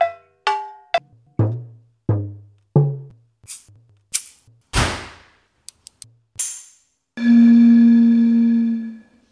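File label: love percussion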